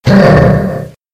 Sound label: bowser hit; freak fortress 2